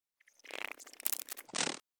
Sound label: Insect Movement Vocal Twitter; Hollywood Edge; sound designer's toolkit v2